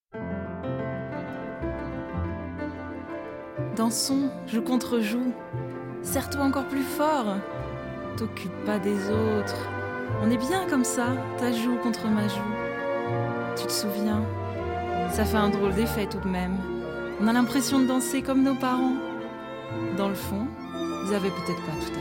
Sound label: Cello and Orchestra